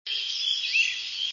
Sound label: flycatcher great crested; Indian Head Point, Mohican Outdoor Center, Delaware Water Gap; piece of call